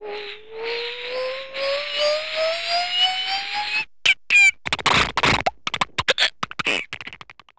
airplane, cartoon

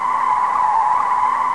skid loud, aircraft landing; aircraft takeoff landing

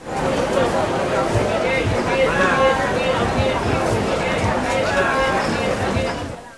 market

